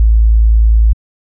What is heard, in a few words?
kick building